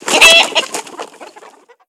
animal chicken disturbed

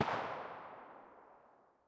ambient; World of Tanks

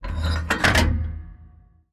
gun reload; World of Tanks

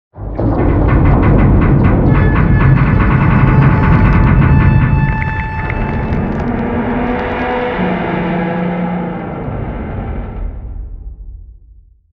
damage vehicle; World Of Warships

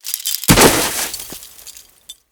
big jump; Modern Warfare

